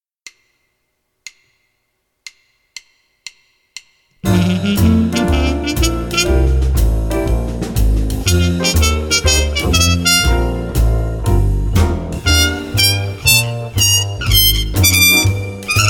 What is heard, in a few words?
g major scale 3 oct